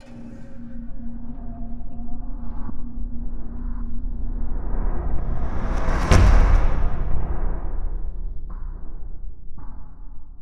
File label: Athmospheres